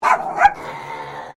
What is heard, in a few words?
Animal Dog Chihuahua Bark Double Snarl; Newcastle; Various domestic dogs; Dog Sound Effects; Dogs (Sound Effects:Animals); Foundation1